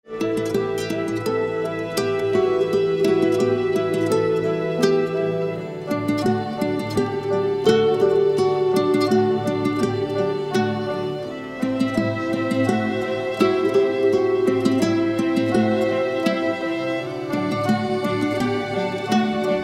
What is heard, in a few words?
instrumental